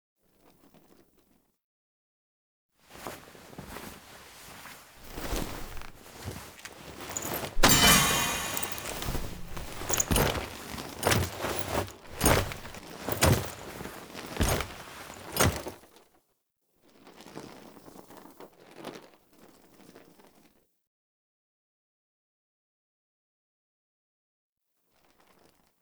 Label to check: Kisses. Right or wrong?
Wrong